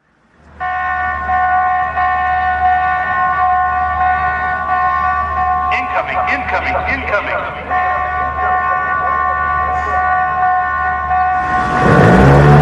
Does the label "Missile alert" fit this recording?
Yes